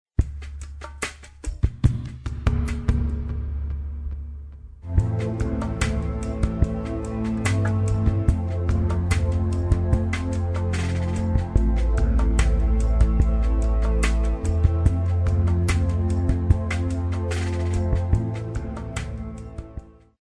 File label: newage